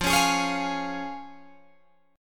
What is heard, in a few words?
F Minor Sharp 5th, Guitar Open G, chord